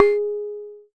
Jomox MBrane, drum machines; Jomox MBrane 11

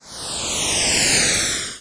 8bit kit ship, 8 Bit Videogame Kit; LO FI and 8 BIT KITS

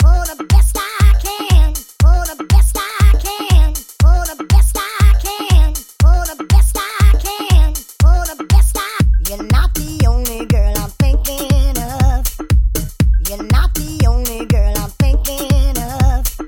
animal beat; dj tony

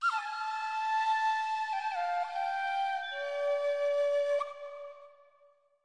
native flute; Flutes

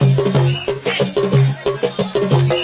182 african dance, drum loops and breaks

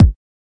kik

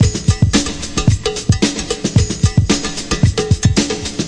classic brk; VIBRIS CLASSIC BREAKS

